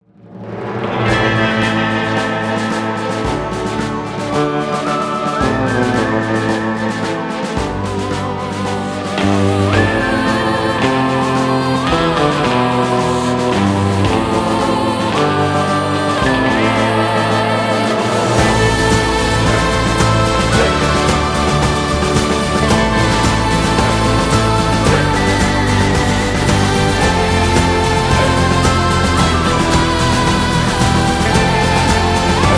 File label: Spaghetti Western